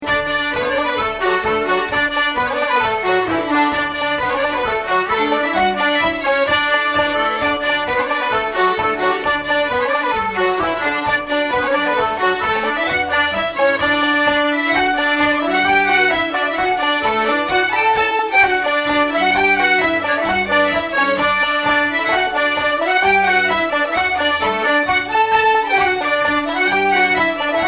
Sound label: Armagh Polka, Irish; Finnegans Players List of Tunes